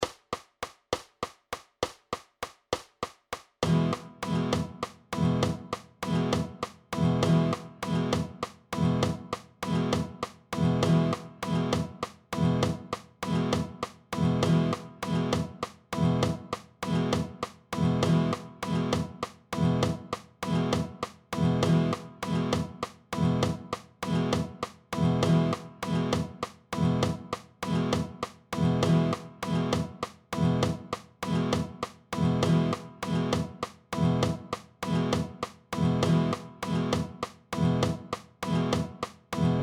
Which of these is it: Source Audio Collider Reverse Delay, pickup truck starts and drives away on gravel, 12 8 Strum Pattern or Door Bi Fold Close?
12 8 Strum Pattern